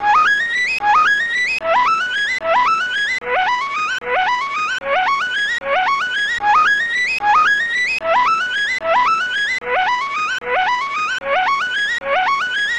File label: arp; 150BPM; Pony Fan Music Remix Stems